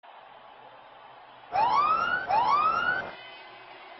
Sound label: no ball cricket siren